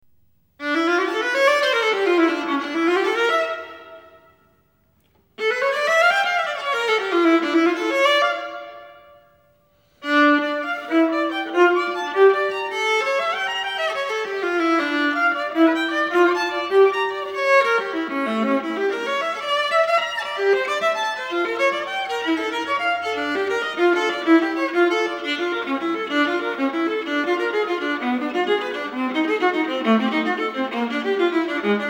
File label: viola chromatic; J.S.Bach (arr. Zoltan Kodály, ed. Atar Arad) - Chromatic Fantasy; Peter Sulski